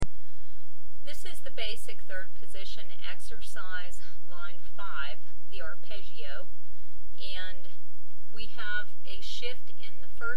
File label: Basic 3rd Position Line, mandolin